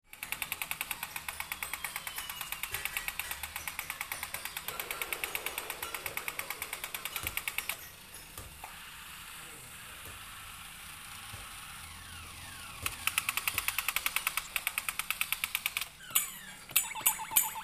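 atmosfera magazina detskih igrushek, atmosphere of a children's toy store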